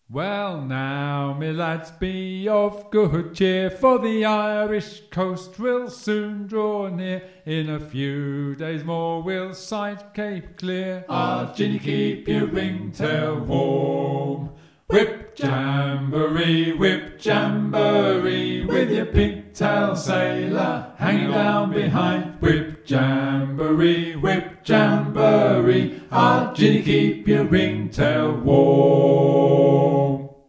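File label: Whip Jamboree, sea shanty singing; eccleshall singathon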